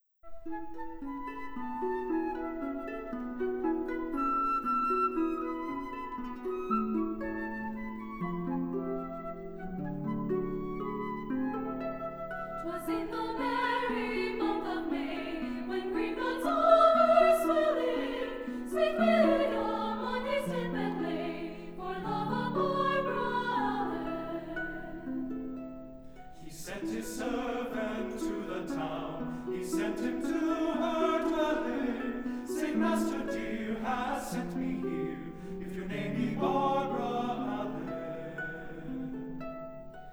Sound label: Choir Test; IsoMike Tests 2006